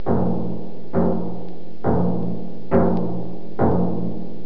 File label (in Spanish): musical